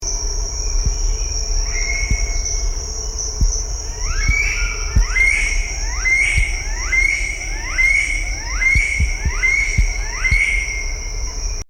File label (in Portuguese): Canto do arapacu de bico vermelho